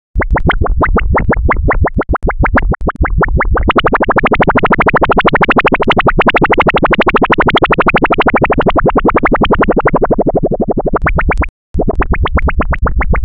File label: wobbly boing twang fast; quirky